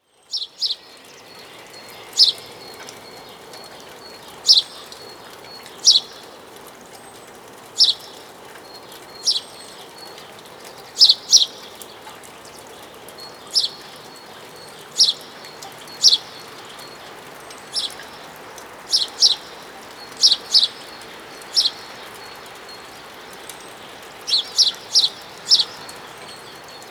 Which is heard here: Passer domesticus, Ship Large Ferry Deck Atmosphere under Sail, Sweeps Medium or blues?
Passer domesticus